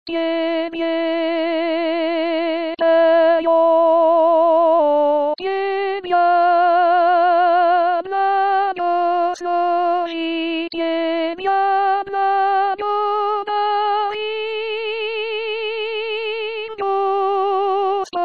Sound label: Soprano